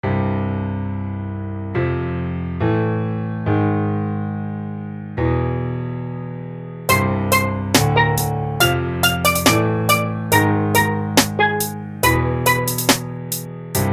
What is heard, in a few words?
perc